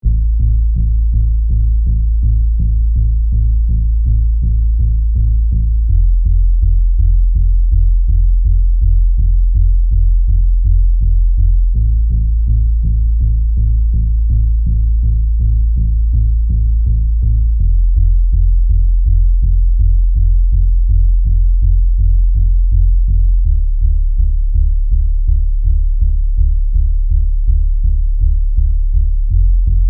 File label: SEQ Bass dry; Pro3